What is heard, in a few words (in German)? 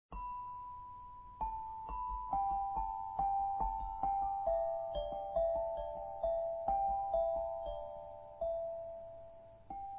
glockenspiel